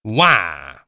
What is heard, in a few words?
whaaa